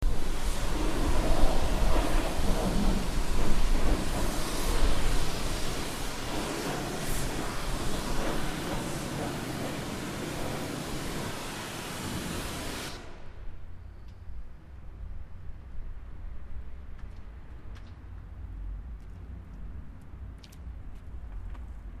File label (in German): hochdruckreinigung